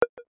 multimedia game sound double short generic click pop